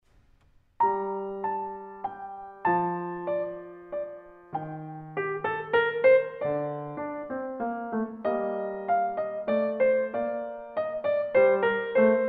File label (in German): Menuet G Moll; JS Bach